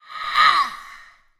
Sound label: Damage; Ezili